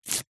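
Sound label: household instructions pamphlet glossy paper rip; zapsplat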